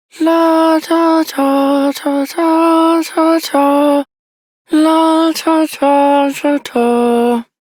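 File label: Child Rhymes Hum, Child Male; Duviri; Childrens Rhymes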